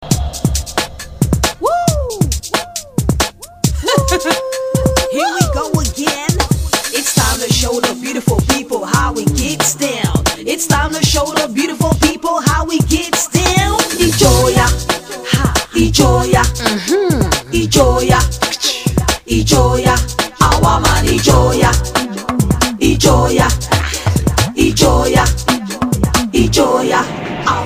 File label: ringtone